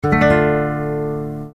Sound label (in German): Der d-moll-Akkord, d-moll d-f-a; Harmonielehre